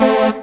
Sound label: chord A; Techno Trance Essentials; CHORDS